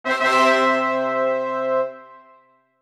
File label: successful horns fanfare